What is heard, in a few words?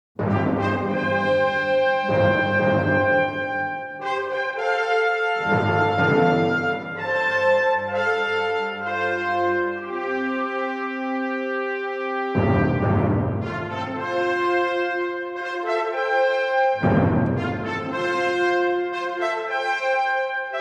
Fanfare; Aaron Copland